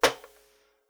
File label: Router Wood Hard